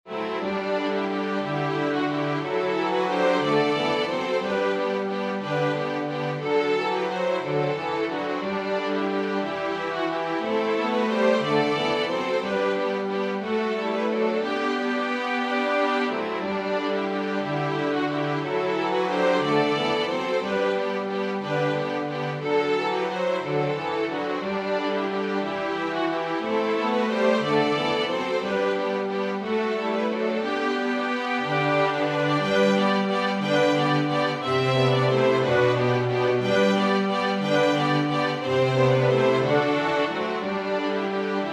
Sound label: The peeking pup waltz